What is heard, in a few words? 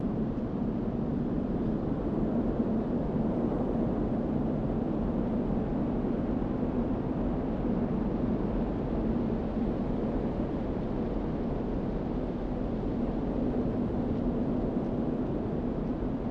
wind; de season css